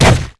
bow hit; RYL

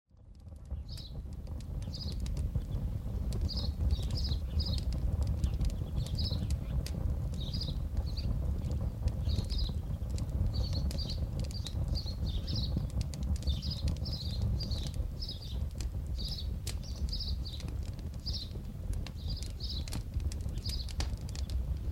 Birds and Burning; Age of Empires III; cinematics

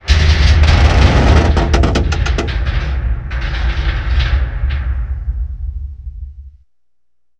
Explosion Structural; Sony Pictures Sound Effects Series

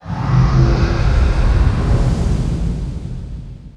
shout; RYL 2

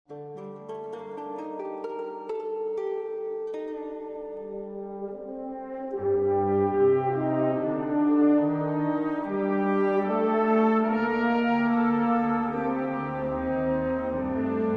Music

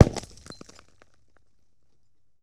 Bullet Into Windshield; Sony Pictures Sound Effects Series; Ricochets - Hits - Whiz Bys

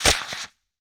Flint Quick Mix, Period Combat; Sony Pictures Sound Effects Series

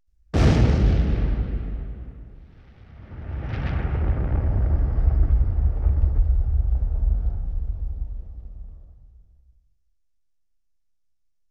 5.1 Explosion; Sony Pictures Sound Effects Series; Explosions - 5.1 Surround

